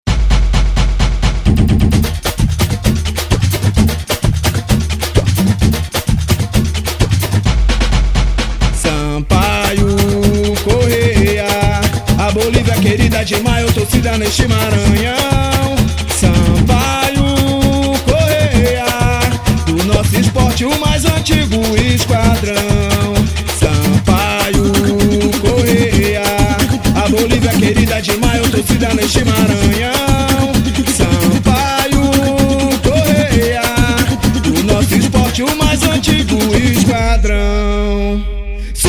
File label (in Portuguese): samba; hino do sampaio; tubaroes da fiel